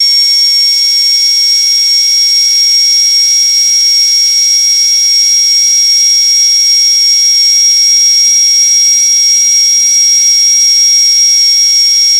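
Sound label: Drill Dentist Constant; hospital & lab equip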